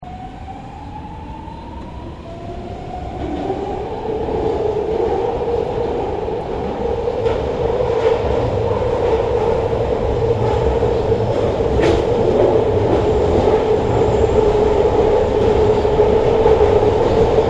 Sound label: Subway train interior; Subways; Vehicles; Yvette Jackson